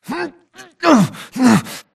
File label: grunt Struggle; Titanfall 2